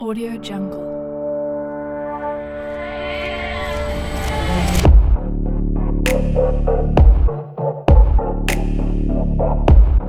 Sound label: slow sexy fashion beats kit